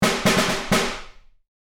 Marching field drum accent

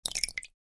Liquid hands in water tiny movements

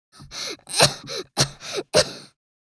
Young girl coughing

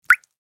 Water droplet plop; Liquid

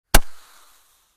Fireworks firecrackers roman candle single launch

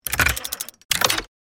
Safe lock vault opening mechanism

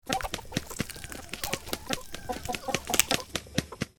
Chickens eating flesh

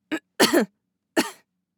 Young woman coughing

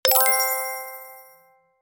Fairy magic touch

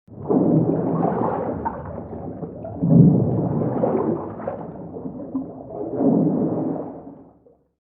Large aquatic dinosaur underwater movement

